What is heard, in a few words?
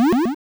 Snack pickup; SFX; Airport Runer